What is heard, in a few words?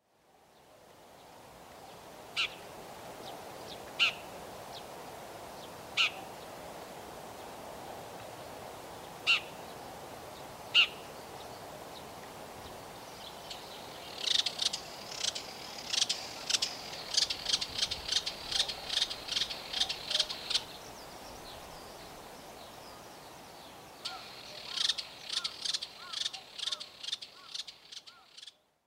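brown shrike, nagano; akamozu; koe; hara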